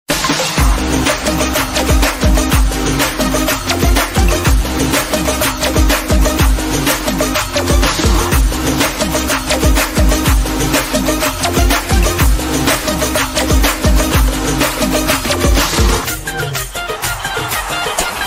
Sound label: tamil, bgm ringtone; Don